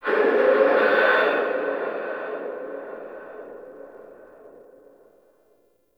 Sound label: Stadium Infested Far